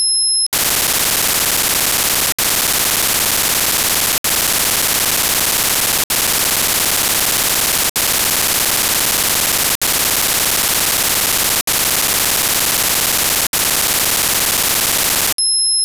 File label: OFDM signal